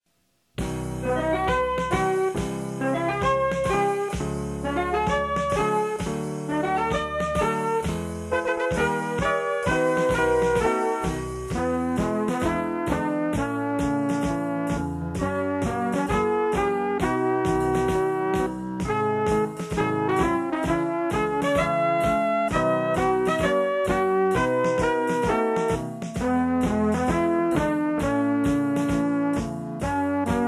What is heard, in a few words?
Warm Up March